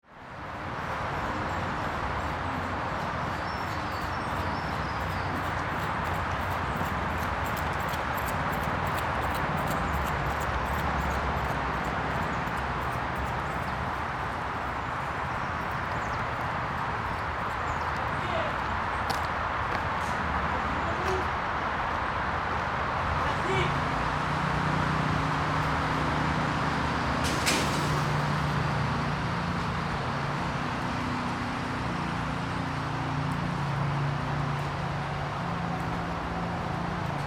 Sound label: garches